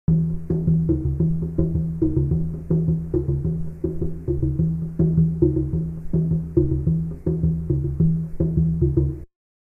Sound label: Congas